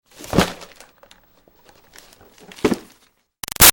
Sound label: Foley Box Hit Xmas Present Moves; Crinkle; Objects